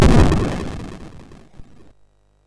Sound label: SFX, MOS6581; Narco Police